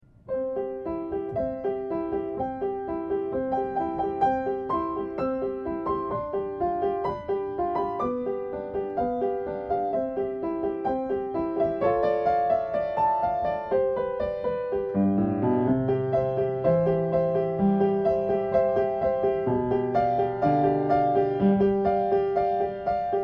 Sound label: piano